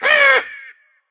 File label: crow, zombie town; hl2